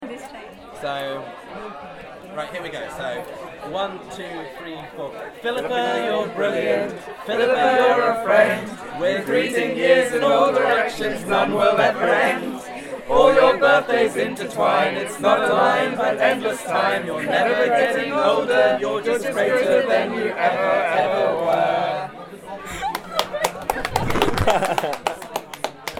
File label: birthday Song as sung at the Red Lion pub